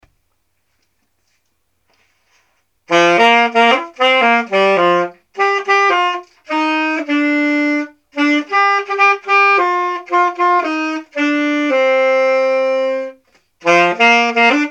souper avec mamie saxo

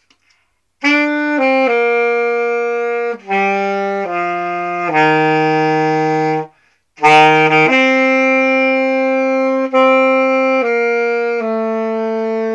vacance saxo

